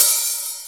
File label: RY30 Hat O; Drums; Yamaha RY30